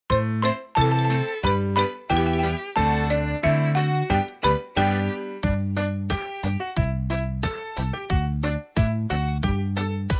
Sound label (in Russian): tanec s platochkami rus. nar. mel.